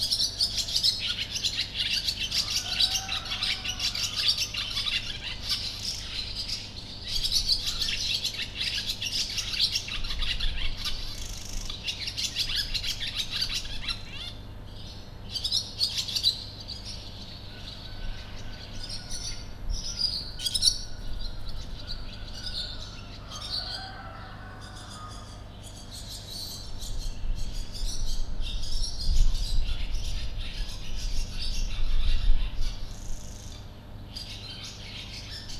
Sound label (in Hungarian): kakas és szél is, parti fecske; allathangok; termeszetben; potamia